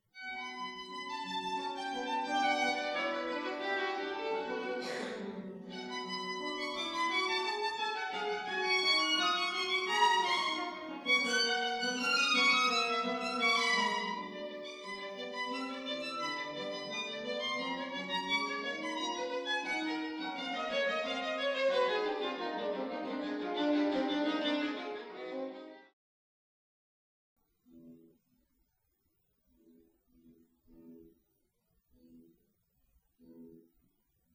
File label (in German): Duo für Violine und Gitarre